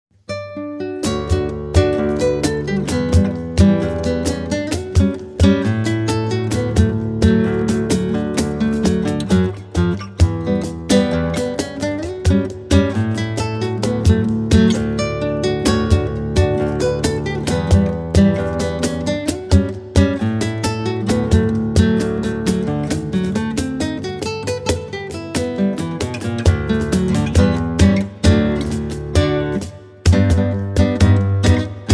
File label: Sound of Bells, flamenco classical guitarist